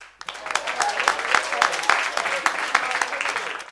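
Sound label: Applause Club Bar Light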